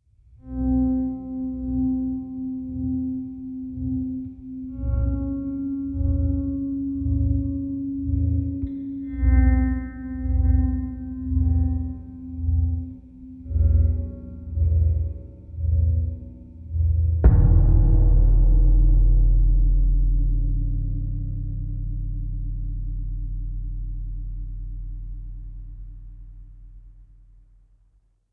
Combat Suspense; The Last of Us; Cannibal Combat 1 Suspense Chunk

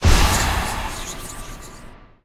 projectile shoot; mage lord; Hollow Knight

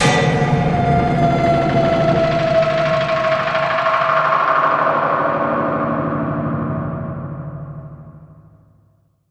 Music Stinger; Hunted: The Demon's Forge; x360

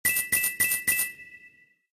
Thief Goblin

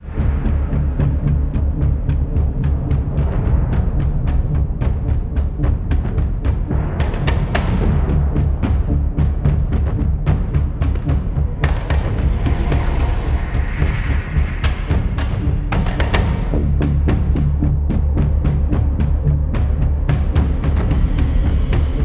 combat marching; wave of darkness windows gamerip 2015

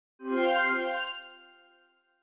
Good Jingle; oddworld abe s exoddus

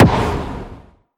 Vehicle Negative

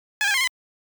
P-Type Missile Hit

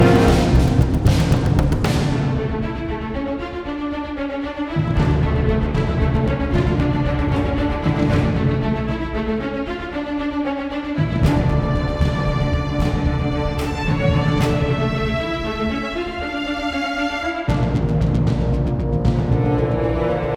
String; Assassin's Creed Unity

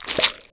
Squish

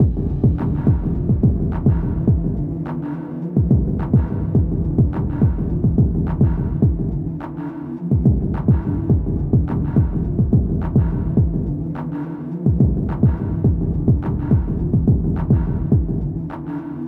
Police Station Radio Inside Far

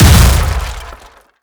Bench Explode

